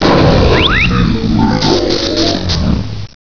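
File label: die, alien controller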